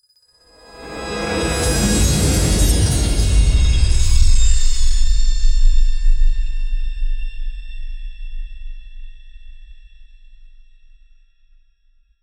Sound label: luxury logo reveal sound; branding